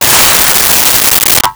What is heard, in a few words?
Junk Crash